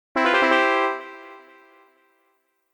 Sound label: Sit Fanfare; Petscop15